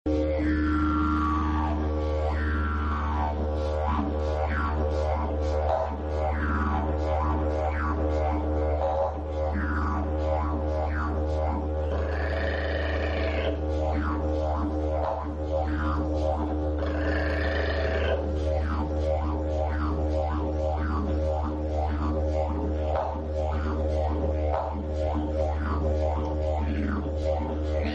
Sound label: Didgeridoo, Aboriginal; new age